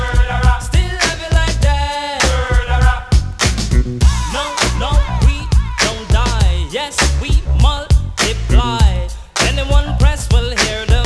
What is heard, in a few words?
reggae